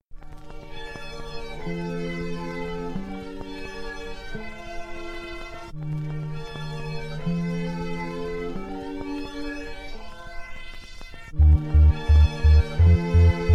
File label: bass